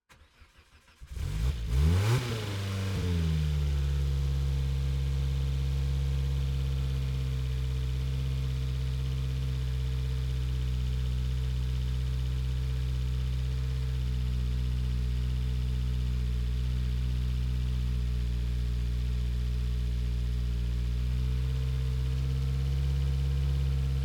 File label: Peugeot 205 - ONBRD - RAMPS - Start Drive Off - ENGINE - Center